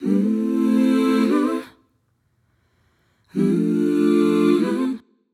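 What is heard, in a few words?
vocal progression dry mmm choir, 144, A minor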